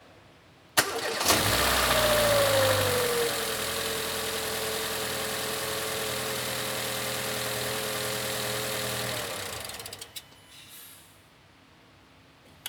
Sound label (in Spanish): Motor gasolina Mercedes A Class